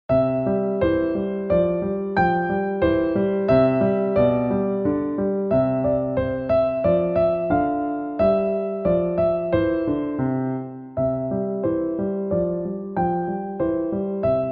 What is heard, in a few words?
melodia